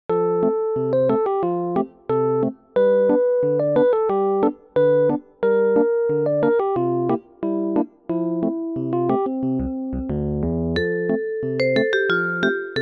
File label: Newage; KIDS 귀여운